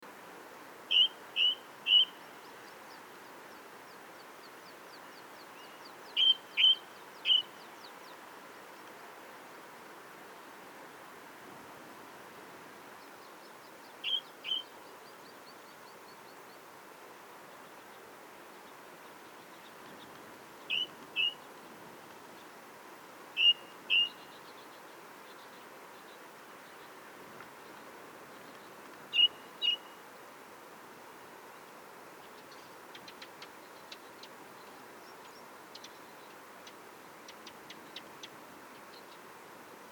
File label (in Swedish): Ringtrast